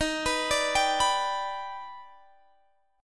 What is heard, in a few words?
D sharp Augmented Major 7th D sharp, Guitar